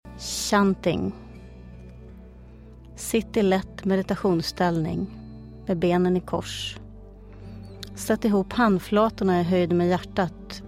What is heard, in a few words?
Chanting; gravidyoga varda dig sjalv